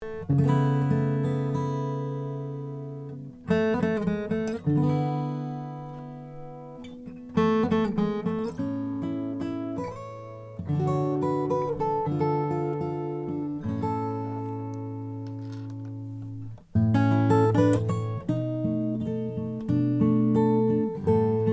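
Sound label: Froggy Bottom SJ Deluxe Indian rosewood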